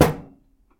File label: knock on microwave; knocking